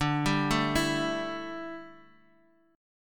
D Suspended 2nd Suspended 4th D down Guitar Half Step Down